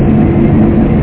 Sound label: tank; ASSAULT